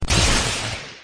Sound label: effect thunder, battle